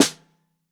SN Bottom, Snare

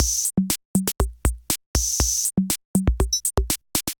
C78 Kit groove 120 bpm, Drum; MIDI Clips; 111 - 130 bpm; C78 Bullet Kit groove; Ableton; Factory Packs; Drum Essentials

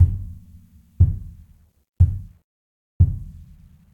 Grand Piano Pedal Off Noise; Ableton; Factory Packs

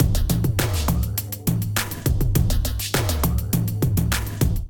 Kit groove 102 bpm; MIDI Clips; Litmus; Ableton; Drum Essentials

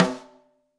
SN Top, Snare; Ableton Foundation FreePacks; Snare Designer

